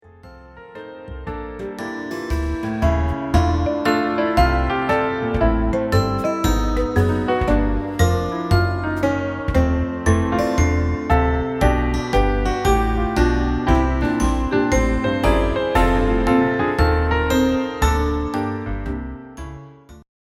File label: Instrumental